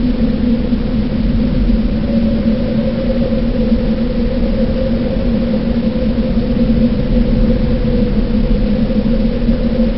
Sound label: suck, ambient